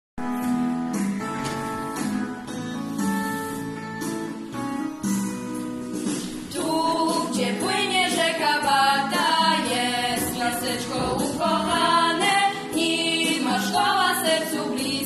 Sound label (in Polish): hymn wokal